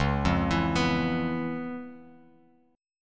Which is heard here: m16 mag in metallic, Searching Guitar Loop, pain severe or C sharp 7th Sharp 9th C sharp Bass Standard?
C sharp 7th Sharp 9th C sharp Bass Standard